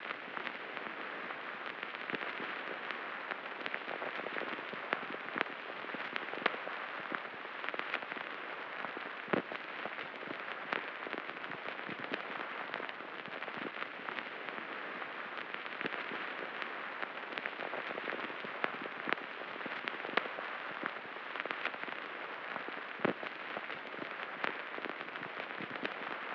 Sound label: 70 BPM B Min Vinyl; Melody Loops; Bluebird; Cymatics; ANALOG EVOLUTION Beta; Lofi Collection